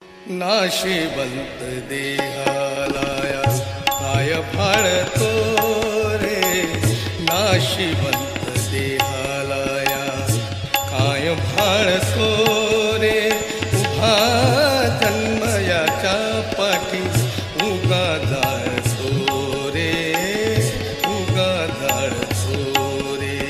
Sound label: Marathi tune ringtone